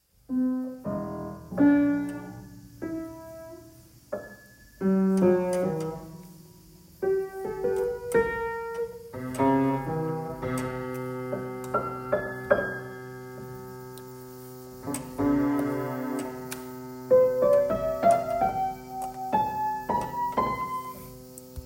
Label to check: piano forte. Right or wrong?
Right